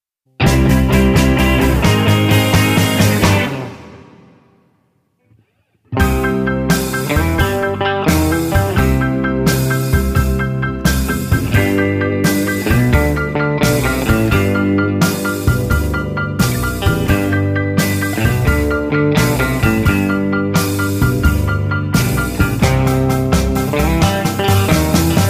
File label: C No Backing Vocals